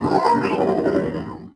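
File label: death assassin Droid; droids robots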